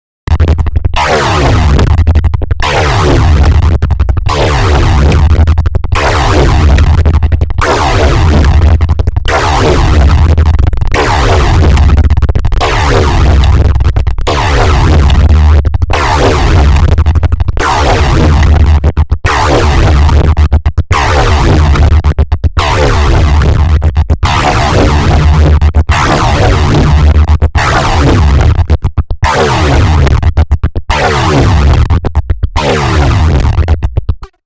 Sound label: industrial